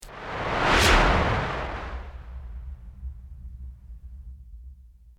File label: bidirectional whip